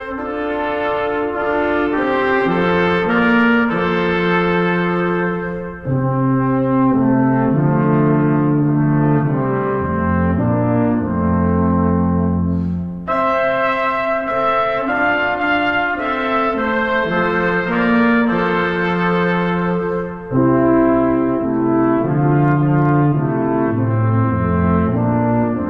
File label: Brass Quintet; Joseph lieber, Joseph mein; Christmas